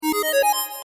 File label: jingle; ユウラボ8bitサウンド工房; アイテムゲット